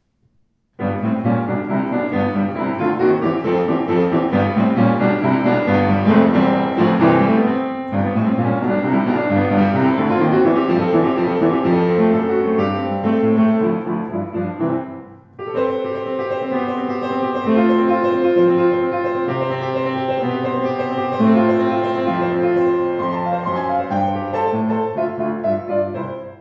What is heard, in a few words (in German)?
Sauter Rag, Klavier